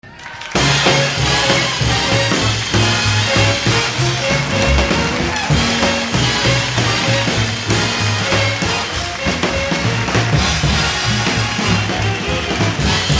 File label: Instrumental, Jazz